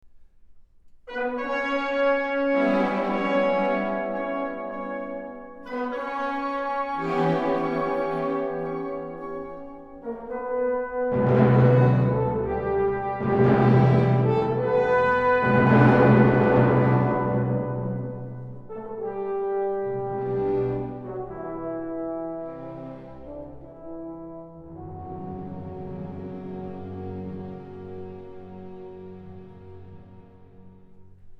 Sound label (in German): Trauermarsch und Chor; Totenfeier